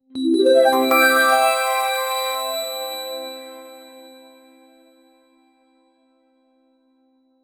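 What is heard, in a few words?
a short UI attention sting, alerts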